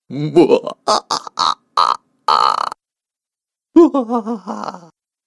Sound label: Cartoon Baddie Laughs; Cartoon Voice